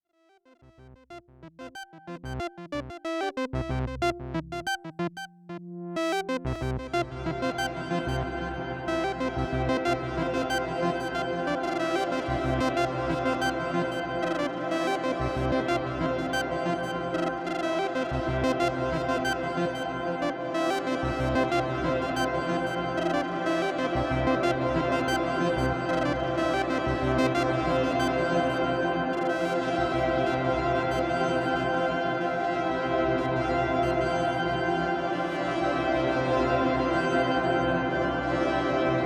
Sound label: Synthesizer Reverb Hall Ventris